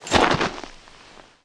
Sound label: foot prone dirt, footsteps